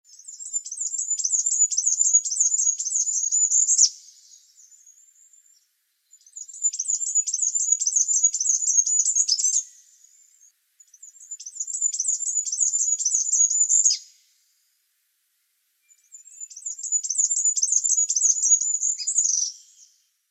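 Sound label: korolek, goldcrest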